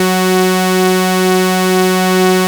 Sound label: MOOG PRO, BASSES